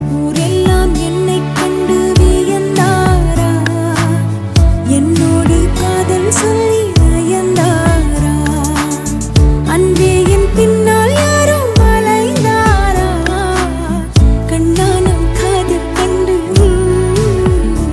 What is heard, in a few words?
Female Version